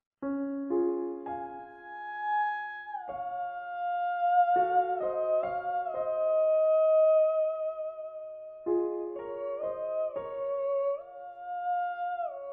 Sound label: theremin full; instrumentos